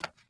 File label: fs marble, footsteps